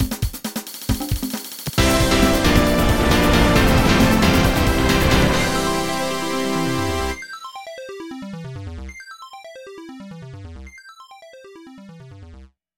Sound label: Level Clear Castle Clear; Super Mario CD Japan Europe; Koopalings